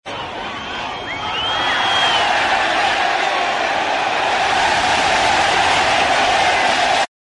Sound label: soccer crowd reaction; sports